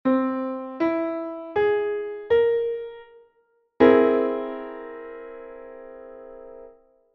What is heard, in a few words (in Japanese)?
和音試聴（C7♯5）